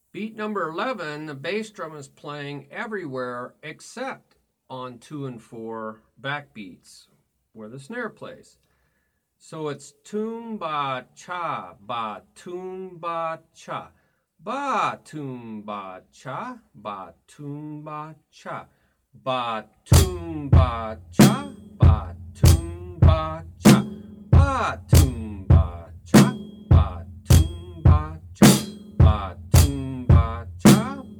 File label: Beat Toom Ba Cha Ba Toom Ba Cha Ba